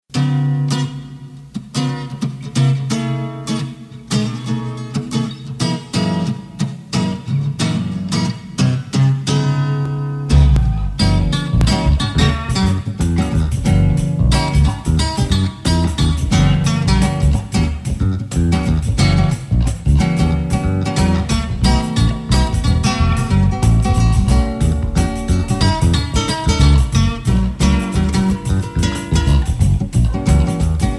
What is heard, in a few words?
Acoustic percussion Blues